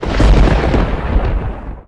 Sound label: Thunder; TematicEffect